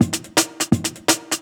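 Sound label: Jungle Breaks, 168 bpm; Original Jungle Breaks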